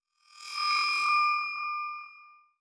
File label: Transition Bell Swell; Adobe; Production Element